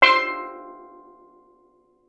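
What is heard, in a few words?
STEEL DRUM; E-MU Producer Series Vol. 7 – Old World Instruments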